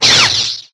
saber; sinfar haks

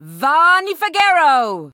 chant; sinfar haks; sf effects

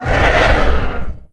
hit; sinfar haks; sf effects

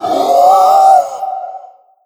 hit; sinfar haks; sf effects

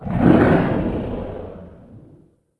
hit; sinfar haks; sf effects

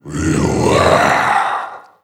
agrunt bat; sinfar haks

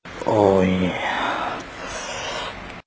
orgasm m

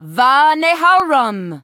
chant evoc; sinfar haks; sf effects

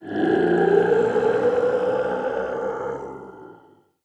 grunt dead; sinfar haks

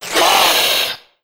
hit; sinfar haks; sf effects

